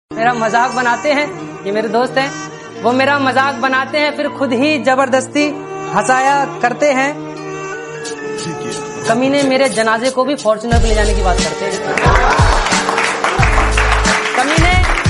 Attitude Hindi

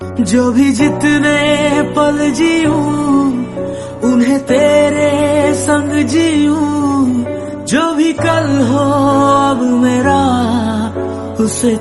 Hindi Ringtone

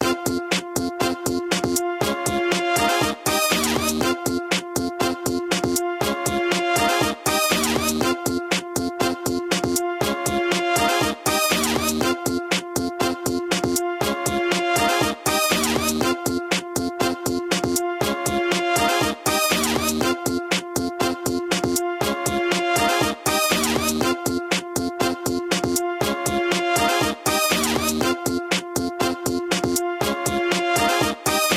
Samsung ringtone

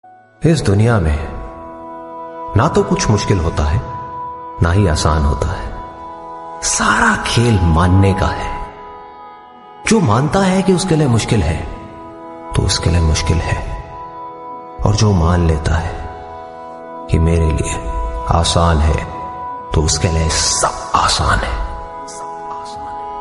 Hindi motivation Ringtone

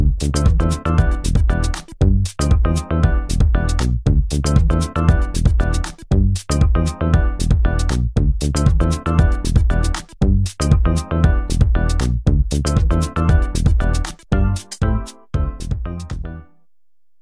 Samsung Galaxy A7 Ringtones Notifications

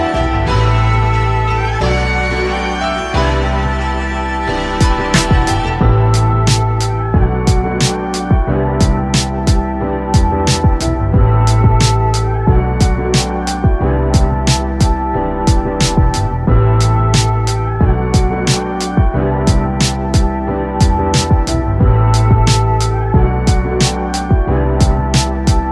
Xiaomi Redmi Note 12 (China) Ringtone

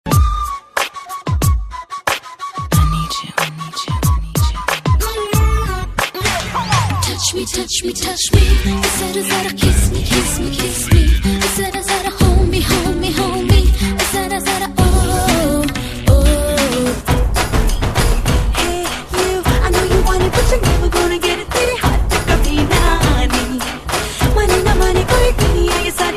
Hindi Mix

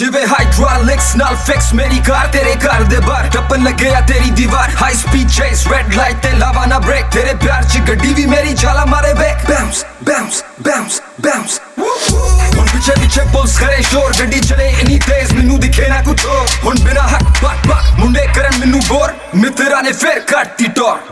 Punjabi Ringtone

